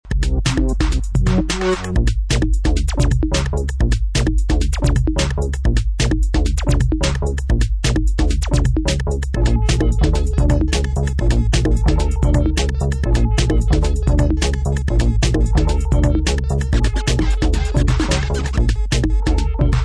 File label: bass explosion